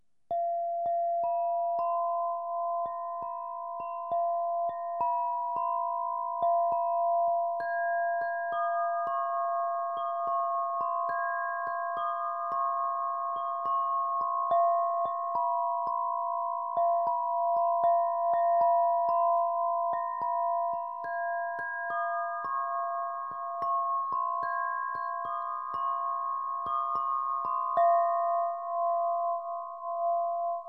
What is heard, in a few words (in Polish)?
Secretive vibrato, harfa krysztalowa; Lekka harfa krysztalowa Secretive